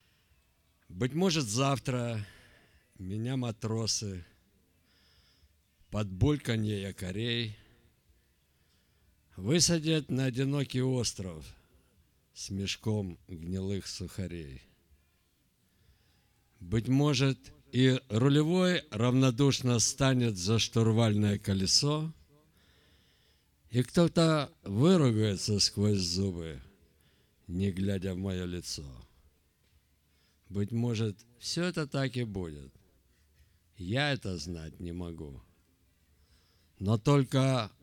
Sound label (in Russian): Пиратская; koncert piraty